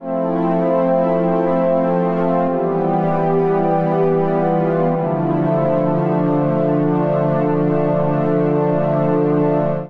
Ambient Swells Pad C Major 97 bpm; MIDI Clips; Tonal; Accompaniment; Drive and Glow; Ableton; Factory Packs

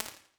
Shifting Booth, Tiny Rooms, IR Samples; Convolution; NI Komplete; Kontakt 5

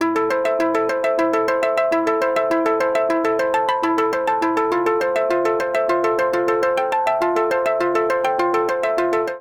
String-Ting Arpeggio Bass A Minor 102 bpm; Accompaniment; Tonal; MIDI Clips; Drive and Glow; Ableton; Factory Packs

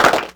Clapbox Pitch, Clap Box; Ableton Foundation FreePacks

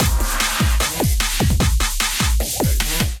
Clubber Kit groove 150 bpm

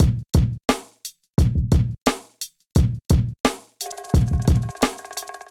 Kit, Golden Era Hip-Hop Drums; Always Yours; Ableton; Sound Oracle

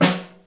SN Room, Snare; Ableton Foundation FreePacks; Snare Designer

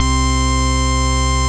Crosswire Retro, Synth Lead; Bass; Ableton; Creative Extensions

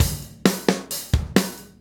133 Surf Slow Drums, Pop Rock, 133 bpm

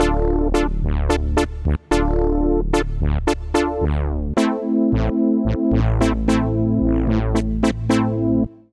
SynBrass (Dm) 110bpm; Music Loops; Pochanuh; Ableton; Singularities

